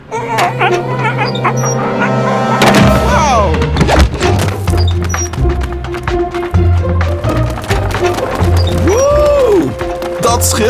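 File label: Outro Victory Dutch; arthur and the revenge of maltazard; wii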